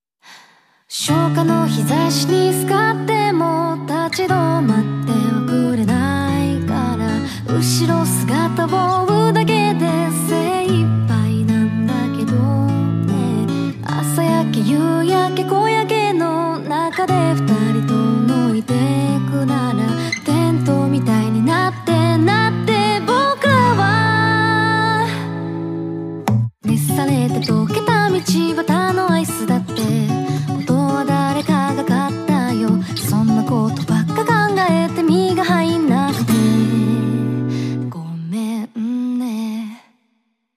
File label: Guitar